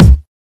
drumset zero; SKY IM-A600S-Sirius; keytone

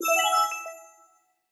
Level Complete; HTC; AT&T-Tilt-2; Teeter

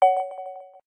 Charging Started; TCL 10 SE

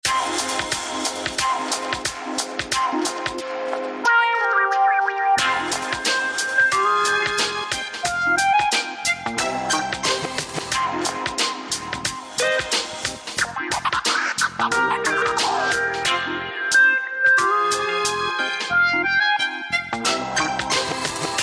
Fugue, phonetones

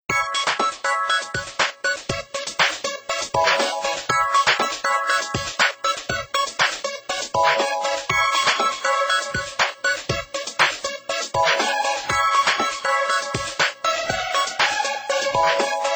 Ring, Samsung SGH-i617 BlackJack II

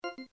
disconnect; GM600

